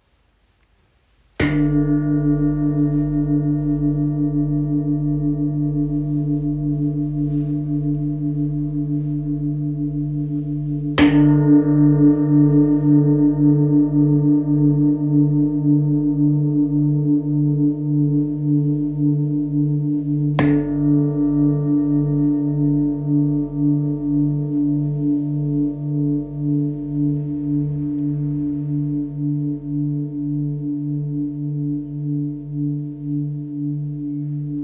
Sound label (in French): Gros bol Re